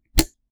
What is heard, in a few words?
Switch